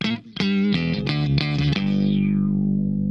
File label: SHOT, Funk Guitar; 90 s Sample CDs; Zero G